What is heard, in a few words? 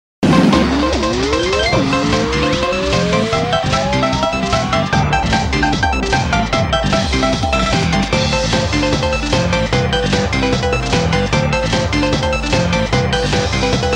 Invincibility; Super Paper Mario; Mega Starman